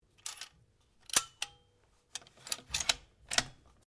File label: RIFLE REMMINGTON700 7.62MM BOLT ACTION CLOSE; A selection of domesttic firearms; Rifles; Guns